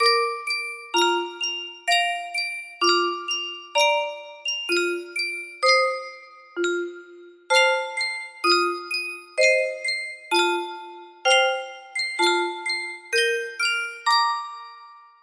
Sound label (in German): sankyo spieluhr